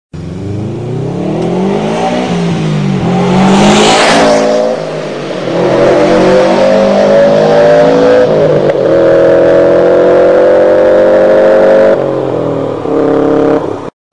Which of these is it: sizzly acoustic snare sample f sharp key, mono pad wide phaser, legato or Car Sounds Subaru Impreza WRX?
Car Sounds Subaru Impreza WRX